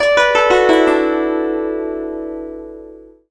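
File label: guzheng; Spectrasonics; Heart of Asia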